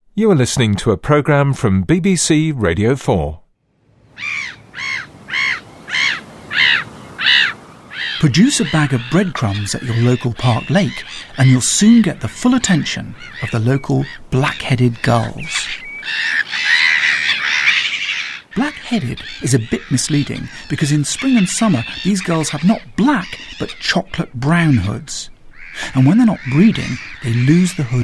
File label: black headed gull